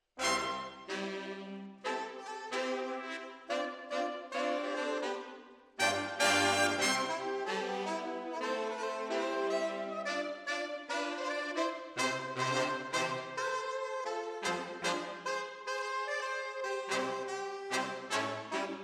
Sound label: Decca, Jazz for piano